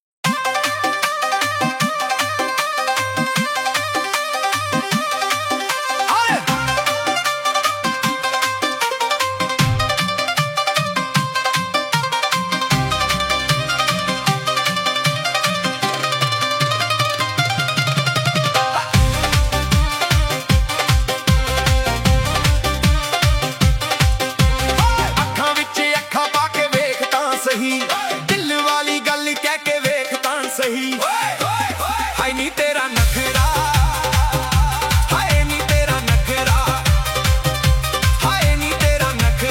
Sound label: Hindi Deep House Remix